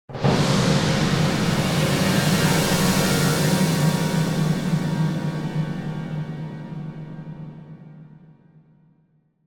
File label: Symphonic Hit In Danger - Symphonic - Increasing; Hollywood Edge; sound designer's toolkit v2